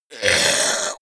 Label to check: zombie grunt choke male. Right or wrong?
Right